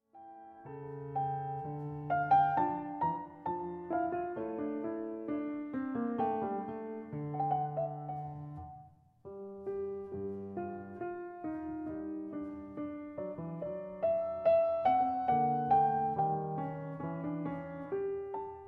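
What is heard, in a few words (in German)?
Andante Canone Alla Quinta fuer Clavier; Variation 15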